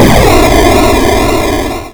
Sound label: PlayerDeath; high hell